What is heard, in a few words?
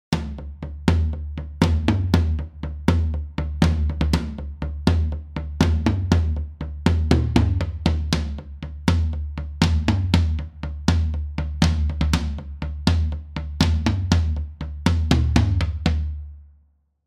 5 khz added to toms